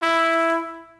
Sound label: Trumpet tone; lego my style preschool